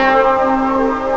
ORCH, STRINGS; STRINGS3

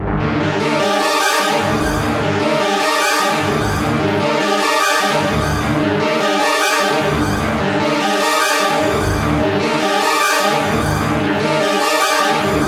FLUTISCHPHAZ, ARPEGGIO 150; Masterbits - Tekkno Trax

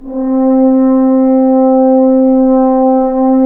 BRS F.HRNS, Roland LA; Roland - LA Composer vol. 1

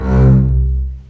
CBS C2 MARCATO, String Collection; East West - The Ultimate String Collection

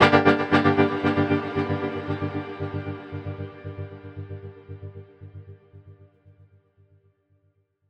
Guitar Chords G; Zero-G - Future Wave

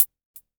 hi hat closed, Korg ER-1; Kit 9

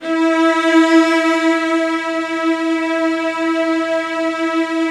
CELLOS F#4, Strings; ILIO; Synclavier Strings

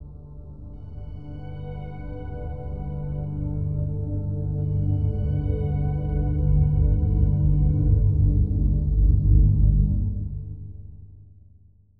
Dark Synth, cinematic drama soundbeds; musicradar

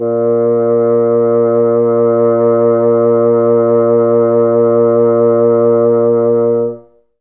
A#1, Bassoon; Best Service ProSamples vol.51; Classic Orchestra 2

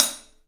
TPAH14, 3D Drums, LITE TIPAI H; 3D Sound

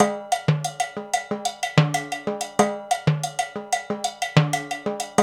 African Contemporary, Mozambique

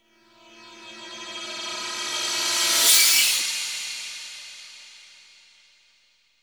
RIPCORD; Distored Reality

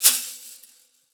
BRAZIL TRIO, Percussion; Voice Crystal - Steve Reid Definitive Percussion; BRAZIL SET

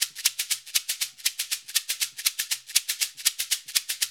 LOOP, SHAKERS, Percussion

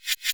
Cymbal pass; EMU Orbit-3

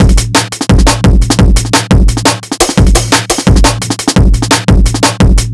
E Beat, Electronic Beats; Drum n Bass; Loops; Beats and Percussion; KJ Sawka; Drum n Bass & Beyond

